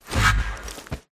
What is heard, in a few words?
Rat dodge